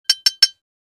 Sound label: Tapping paint brush against glass remove excess paint